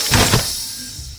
door open; among us

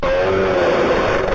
hit sound; baldi

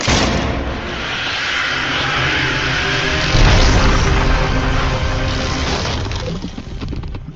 crucifix success sound; doors

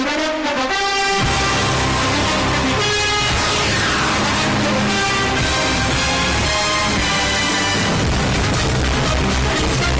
bass boosted; nfl

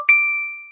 apple pay original

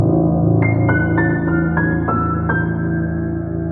piano; hobbikats

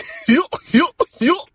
goofy laugh; mickey mouse

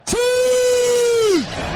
siuuuuuuu bass boosted

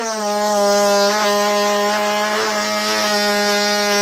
long boo fart

